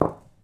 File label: Rck Stn Hit; moul